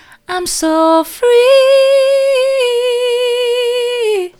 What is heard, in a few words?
soft, vocal